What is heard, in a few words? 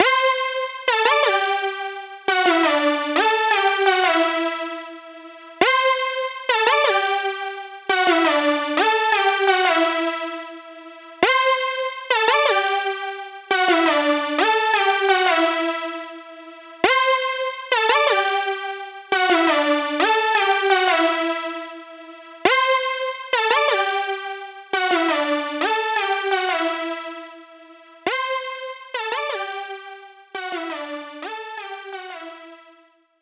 Synth